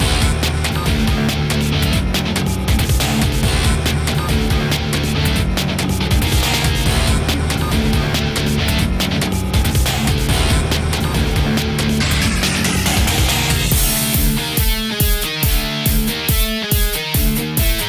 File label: Ghost Train Boss; Dungeon Fighter Online